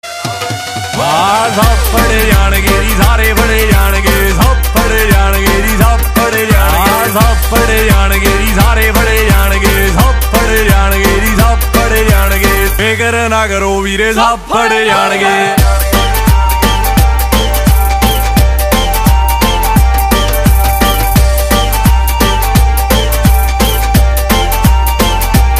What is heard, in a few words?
bollywood ringtone